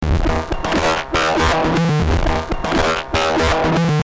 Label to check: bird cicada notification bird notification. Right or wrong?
Wrong